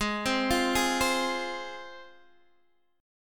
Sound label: Ab 6th Ab, Guitar, DADGAD